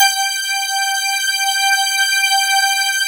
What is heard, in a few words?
PERCP, PERC PAD; Sound Gallery - Charged